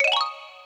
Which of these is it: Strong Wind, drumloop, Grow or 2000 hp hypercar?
Grow